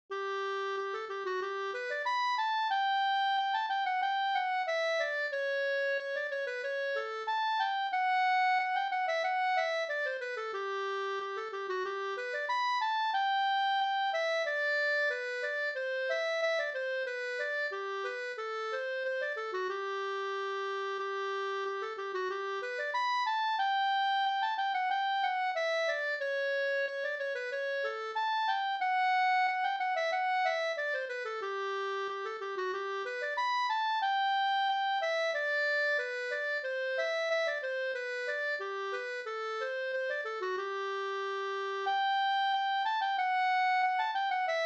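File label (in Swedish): brudmarsch Hango brudmarsch Finland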